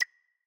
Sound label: ui banter click